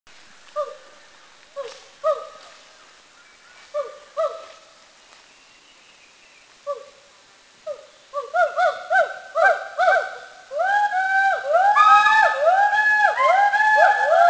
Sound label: Hoolock Gibbon; Mishmi; India